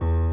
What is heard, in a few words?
piano low